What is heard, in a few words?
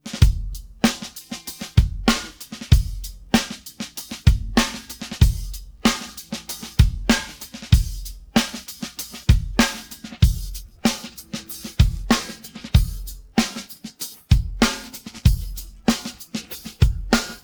pattern, drums